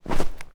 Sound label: snow footstep